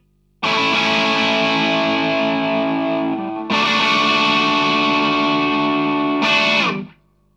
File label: riff